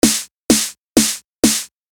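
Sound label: Snare Slow Attack Slow Release; audio compressor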